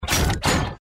jetpack equip, power armor; powerarmor t51

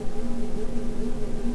idle loop, thunder pistol; cso

